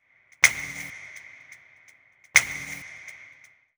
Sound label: Pop Snaps; Pop Rock; Pro Tools Essential Demos; Digidesign; Essential Loop Demos; Demo Session - Pop Rock